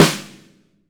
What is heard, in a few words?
SONOR, SONOR SNARE